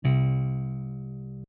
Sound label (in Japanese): ベース C2